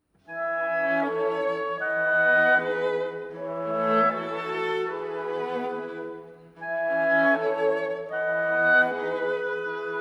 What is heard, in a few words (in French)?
instrumental et melodie; reprise et fin